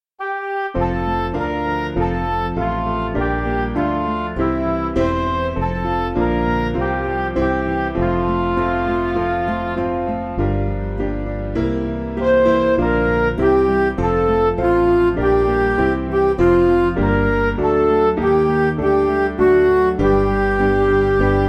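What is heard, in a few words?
Music Backing Files for Churches; Hark The Glad Sound; Bristol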